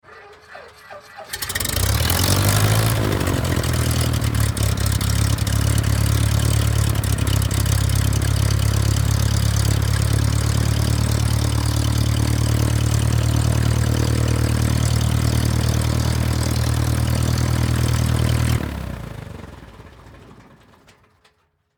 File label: Airplane Cessna Start Idle Stop, A Cessna propeller ai